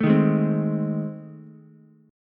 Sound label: A Minor Major 7th Flat 5th A, Ukulele; AmM7b5 strummed; 2,3,4,3